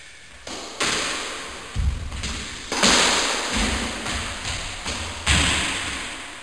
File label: Squash Being Played in Gym; Soundsation; Human